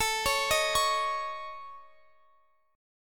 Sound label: A Major Flat 5th, Guitar, Open F; x,x,21,20,18,20; AMb5 arpeggiated